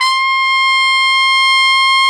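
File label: trumpet, harmon dry; Solo Brass; Trumpet 5-7; Tp 5 RCA Jaz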